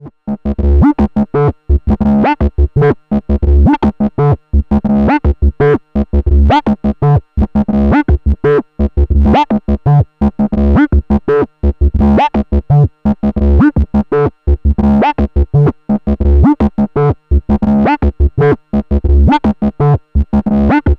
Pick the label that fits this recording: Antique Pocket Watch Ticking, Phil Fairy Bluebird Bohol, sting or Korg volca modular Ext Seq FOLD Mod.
Korg volca modular Ext Seq FOLD Mod